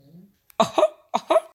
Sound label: aha aha ringtone